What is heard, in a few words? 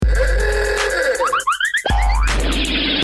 national anthem; ohio